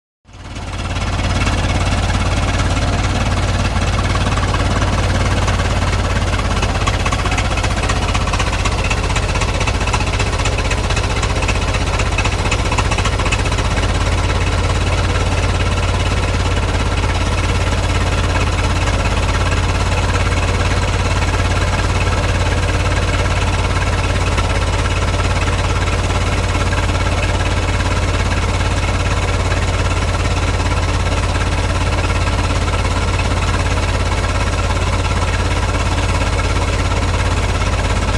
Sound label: Automobile; Idle / Away; Model 't' Ford Start Up, Short Idling And Away, Then Close Idling And Away. Nice Chuggy Engine.